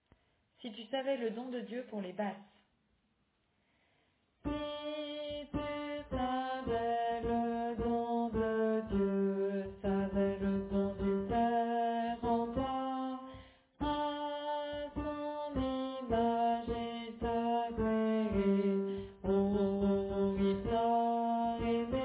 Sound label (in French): Basse